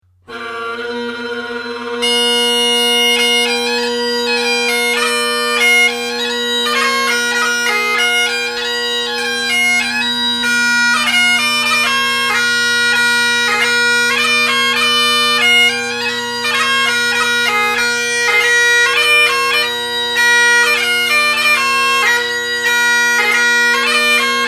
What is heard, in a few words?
Whistle O’er The Lave O’t, bagpiper; highland dancing; Halifax Bagpiper